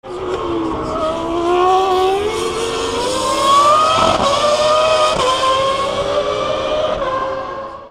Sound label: F1